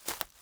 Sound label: foliage step; teardown; ps5 windows xbox series xs